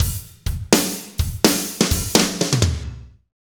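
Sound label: slow shuffle, Roland drum beats; Northstar - Drumscapes Roland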